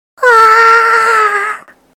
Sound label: oaaa